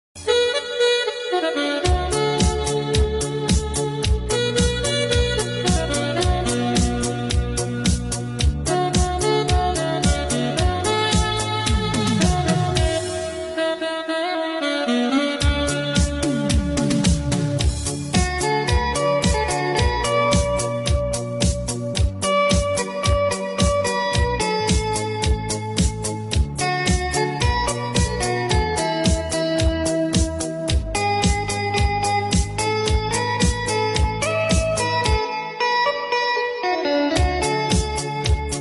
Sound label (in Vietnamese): saxophone không lời